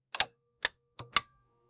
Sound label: studio